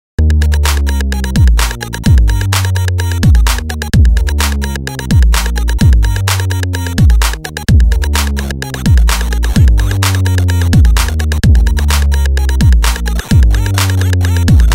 Techon; Soundzabound Volume 5

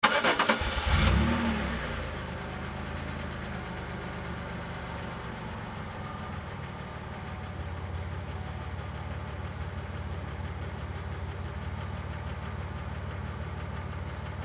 Car Start and Idle

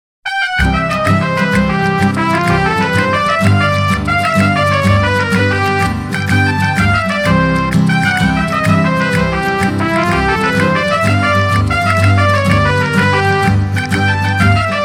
Mexican Hat Dance Traditional; Soundzabound Volume 2 Disc 3

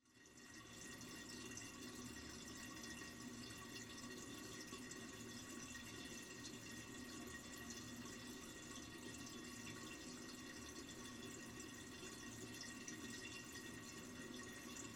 bathroom

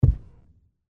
footstep

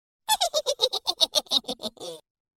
Witch Cackle; Soundzabound Volume 3 Disc 3

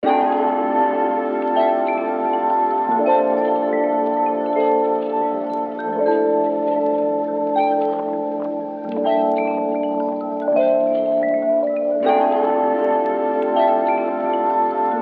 Piano lofi

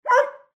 dog bark

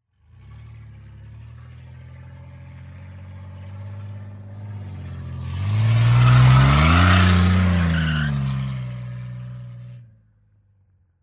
Car Drive Tire Squeal

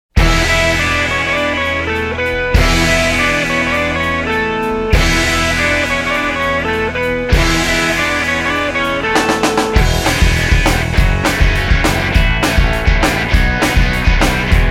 Sitcom; Soundzabound Volume 3

